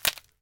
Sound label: Crak Sec